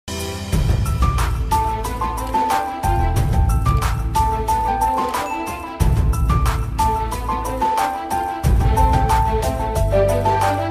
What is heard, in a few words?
vaathi bgm ringtone piano instrumental